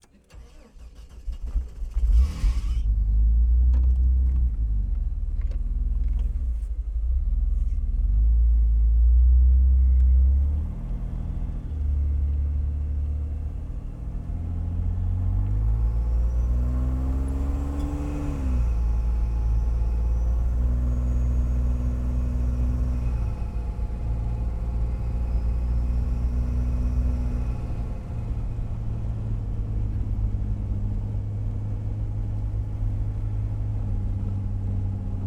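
jeep wrangler onboard start medium drive off interior; Jeep Wrangler YJ 1991; DPA4061; Pole Position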